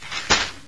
drop news; aomdc

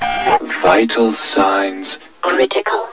zombie idle; sven; zombie hev

